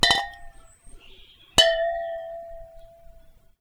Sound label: Water Clink, Glass Clink In Water